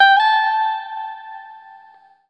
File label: Guitar & Bass; Quantum Leap; 90's Sample CDs